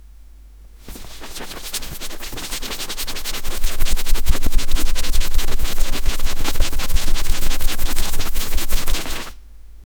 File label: hand is searching inside